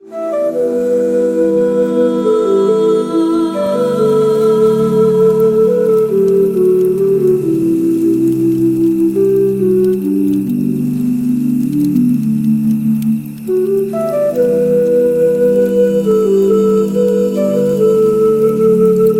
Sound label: Rain and flute